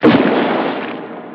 bomb hit

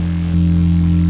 dog bark; pod skyrace

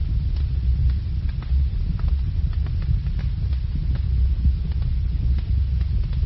lawnmower

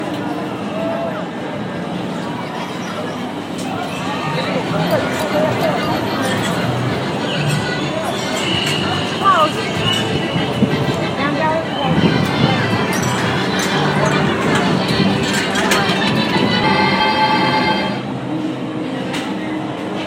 kids

